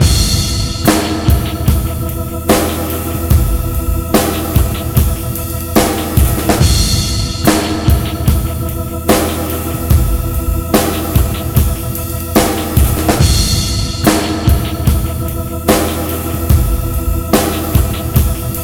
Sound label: 72.7 bpm, Breaks; DOPE DANCER; POTBreaks